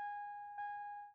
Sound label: belt alarm